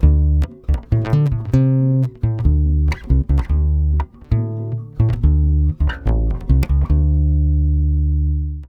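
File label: 140 bass Dm11, brazil bossa; USB Soundscan; AKAI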